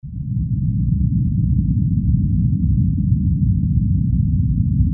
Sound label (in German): fluglaute